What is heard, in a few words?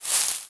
Grass footstep